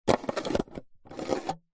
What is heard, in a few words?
food drink plastic straw slide in plastic fast food drink lid; zapsplat